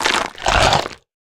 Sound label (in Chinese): 骆驼进食声